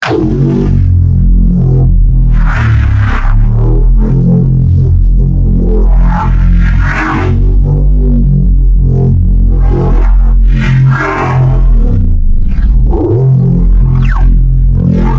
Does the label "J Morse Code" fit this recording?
No